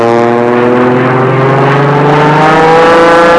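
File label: propeller plane; trasporti; aerei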